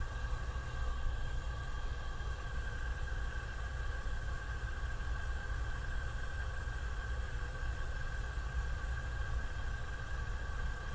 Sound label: stove gas; DeadFright